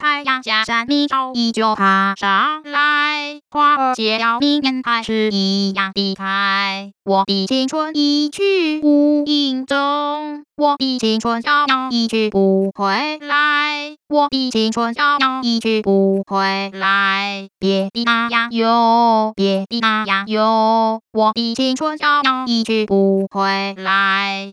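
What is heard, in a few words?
Synthetic singing voice (female), Pitch coartcltn, Chinese; [太陽] 下 [山明] [朝依] 舊 爬 [上來], / [花兒] [謝了明年] 還 [是一樣]; Mandarin Singing Voice; Pitch Coarticulation for Mandarin Singing Voice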